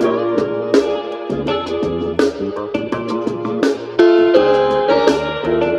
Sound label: full strings attached, Phonky Loops